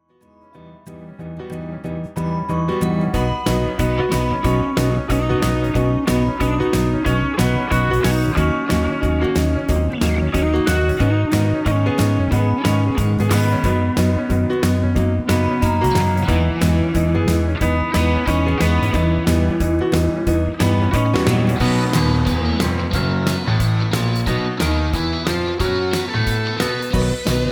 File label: Cheerful bouncy, Music; Fash Video Music Demo